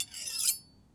Big Knife - Scrape, kitchen knives; Micro Pack

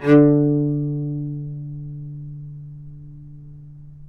D3 - Sforzando, novice cello

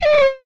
SciFi Gun; retro arcade; Guns

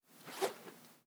Twirl, Organic Wooshes; Smol; Micro Pack

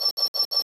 alarm, loops; cc0 sfx loops

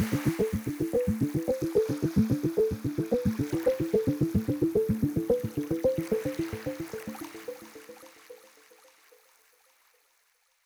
Arp Fadeout; Solos; BB 2HTC Samples Vol 4 Addendum

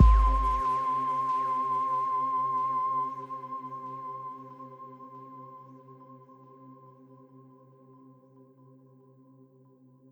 Vibrato Sine Fade; Pads; BB 2HTC Samples Vol 4

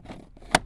Plastic Thump; BB Retail Therapy Sample Pack

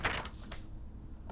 Paper Flutter; Micro Pack

